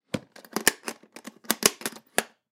Camera Case Latch, Camera Case Latch Shut; Cameras; Electronics; Technology; Blastwave

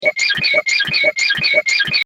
SciFi Spaceship Radio Bad Connection; Spaceship Radio - Bad Connection, Weird Alien Noises; Communication Sound Effects

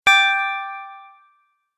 Start Button, button sound effects; multimedia

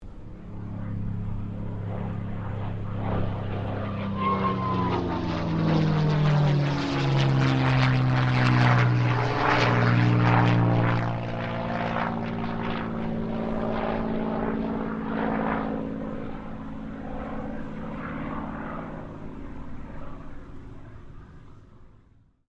AIRCRAFT CA-18 MUSTANG PASS; P51 /CAC-18 Mustang; Airplanes

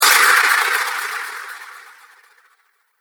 Spirit Of The Spitting Cobra, ghost sound effect

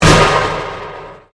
Convolution Pulse

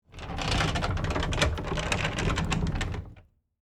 Industry, Cart, Rotate, Spin, Wood, Surface, Slow; Spinning

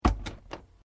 Door Pivot Interior Plastic Cabinet Small Close; Various doors; Miscellaneous Doors

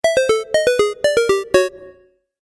Game App Game Over, Video Game Sound Effects; Twistedtracks

